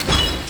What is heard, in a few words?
step; kingpommes; starwars; atrt